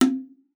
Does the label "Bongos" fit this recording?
Yes